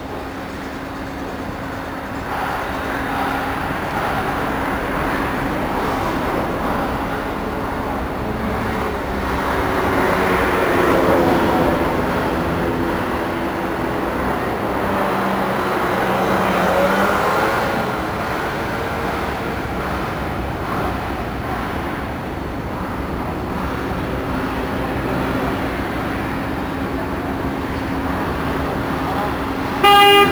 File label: ENV EXT Building site traffic